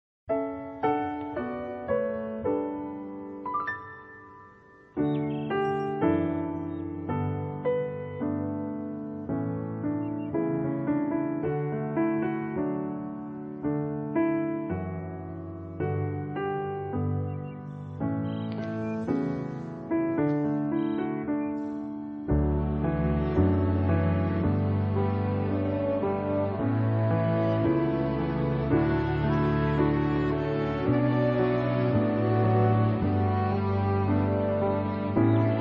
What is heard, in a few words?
Karaoke Instrumental DUET Sing along